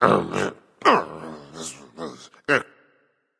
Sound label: grumble; Smite; baronsamedi